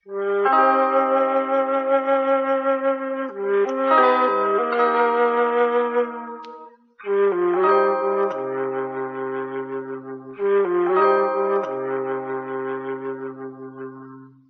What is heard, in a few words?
flute, Sad